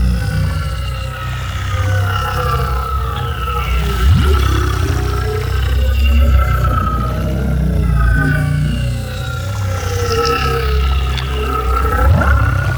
Mushroom Hum Root Loop; Ambience; Props; Warframe; Nokko Colony

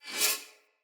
Split Sword Accent Heavy; Weapons; Player; Swords; Starter

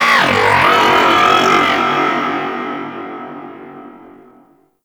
Outpost Creature Robotic; Corpus Outpost; Warframe

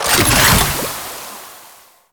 Alchemy Grenade Deposit Toxin; Entrati

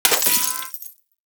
Bullet Impact Debris Glass

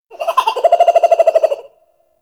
Forest Creature Mid Medium; Ambience; Warframe; Grineer

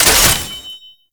Last Shot, Weapons; Warframe; Braton

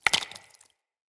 Sap Drip Fall; Ambience; Props; Neathuns Root Cave

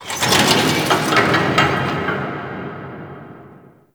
Turret Moves, Fortress Ambience; Warframe; Grineer

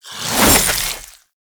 Infested Boomerang Whoosh; Weapons

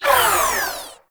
Uzi Tail Wind Down; Warframe; Tenno; Prime Tenno Uzi

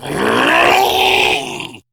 Attack, Battle Barks; Warframe; Grineer Ghoul Sawman

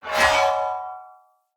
Great Sword Whoosh Metal; Weapons; Swords; Dendrit Ara Great Sword

